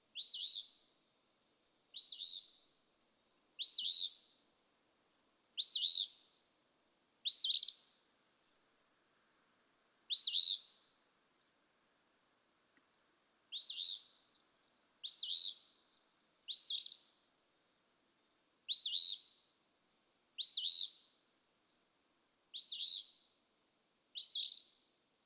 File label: Eastern Phoebe